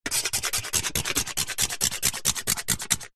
foley metal sharp metal object fast scrapes on metal panel surface